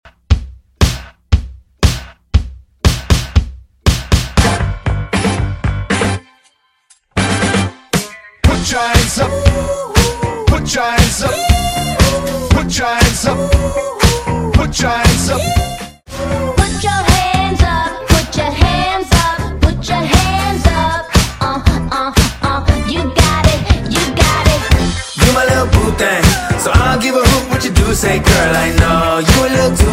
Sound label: Hype Intro Re Drum